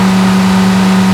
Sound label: Mia rpm, Engine; Cars; XBOX